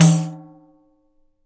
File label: PANDEI OPEN, BRAZIL